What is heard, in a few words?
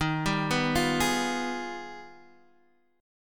G sharp Minor Major 13th